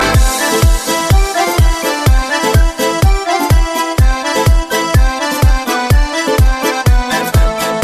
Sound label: drums and pipes; meloboom